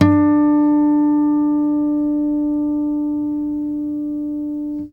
NYLON HARMO

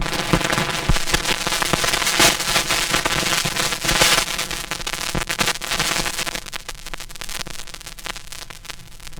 FX SWEEP; SYNTH FX; SYNTH SWEEPS

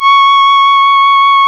C#, Synclav FM, WIND; Big Fish Audio

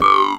boom; PlatinumPhattCD1; E-MU Producer Series Vol. 8 – Platinum Phatt; Phatt Combo

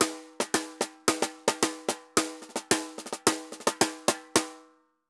Tambor Murga 110 bpm; Best Service; Festa Latina VOL-1

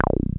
303 RESO; Pro-Rec - Synthsonic

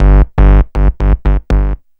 BAS, 2 Step

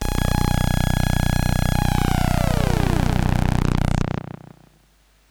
Classic FX; radiosonics; Classic Simple OSC FX

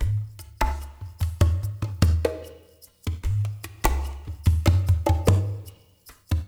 PERC MX; Spectrasonics; Liquid Grooves 1 & 2; SMOOTHSTX

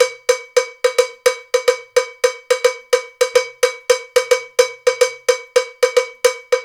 Bembe 6 8, World Dance

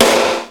Snare, Fairlight CMI III

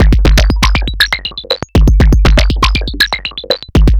Anabeat Mix, Beats; Sonic Foundry (Sony Creative Software); Prototechno

